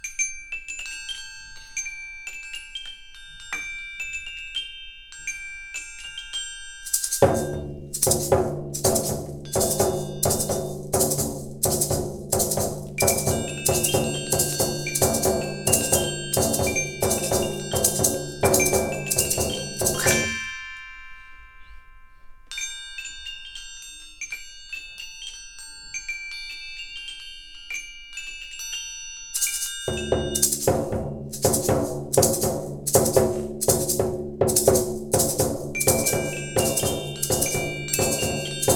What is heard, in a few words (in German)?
Klingelton